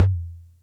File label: Music Percussion Computer Tom, Drum Machines; Atlantex MPC-1